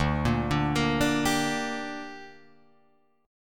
D Suspended 4th Sharp 5th D down Guitar Drop D, chord